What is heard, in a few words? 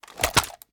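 assault rifle reload end; gmod; viper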